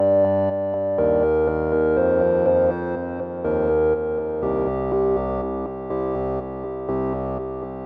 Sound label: 122 BPM Melodies Amaj Gmin Tonic C; mmb studio melodic